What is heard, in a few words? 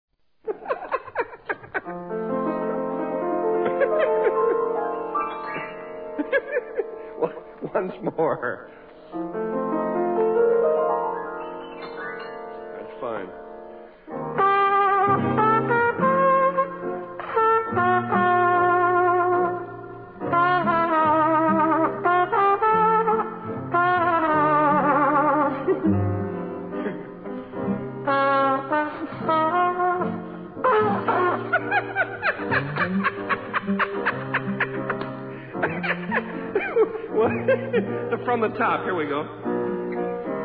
The Laughing Trumpet